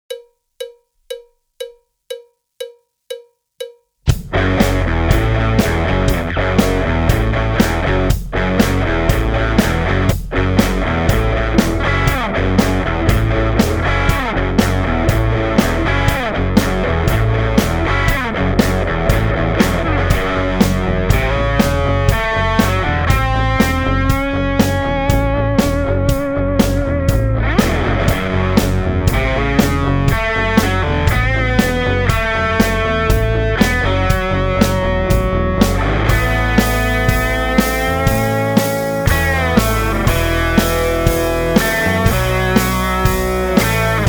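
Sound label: - with drums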